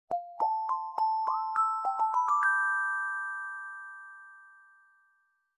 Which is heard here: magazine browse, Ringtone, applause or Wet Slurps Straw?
Ringtone